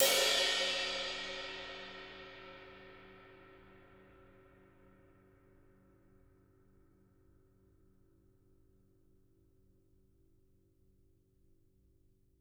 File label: ride, Living Drums, JZ CRASHES; Sampleheads; Peter Erskine